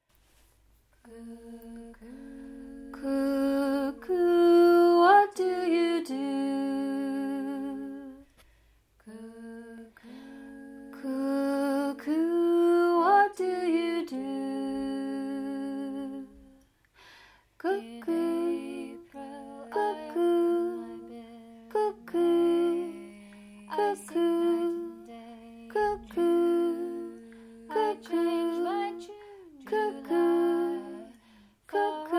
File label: cuckoo top; Soprano